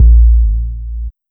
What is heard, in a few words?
Sub, drum